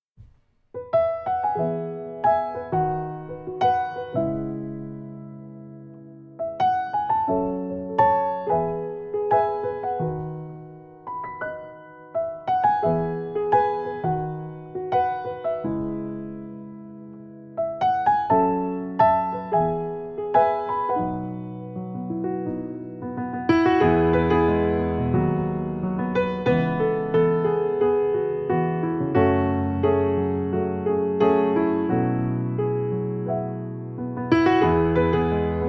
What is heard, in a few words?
Piano Version